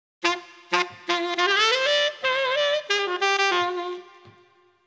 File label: Skippin G Maj 12 beat 90